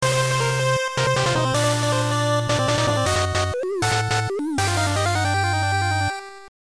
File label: stage start; rockman7